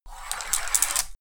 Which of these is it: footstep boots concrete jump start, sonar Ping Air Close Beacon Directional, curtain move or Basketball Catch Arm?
curtain move